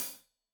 Hat Loose, Hi-Hat, Cymbals Stereo

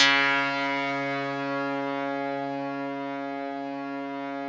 RESMET2 C#3, Yamaha VP-1, RESO METAL 2; Music Store; 90's Sample CDs

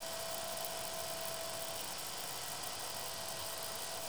MACH B, MACH BACK; Total Control - Action Cutz

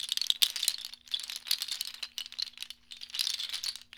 Abstract Pod Loop, ambient twinkles

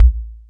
TR Kick, Yamaha FS1R

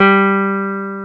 Jazz Guitar

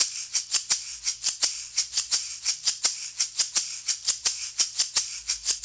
Shakers, 70s Analog Funk; Sonic Foundry (Sony Creative Software); What it Is! 70s Analog Funk

